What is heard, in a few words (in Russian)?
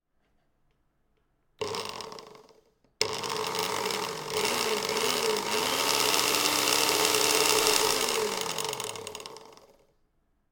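звук бензопилы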